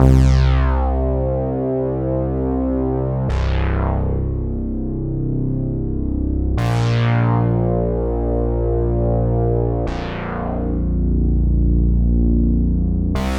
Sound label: 73 BPM C Min Bass; Melody Loops; Mainframe; Retro Collection; Cymatics; ANALOG EVOLUTION Beta